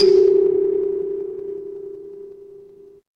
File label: instrument kalimba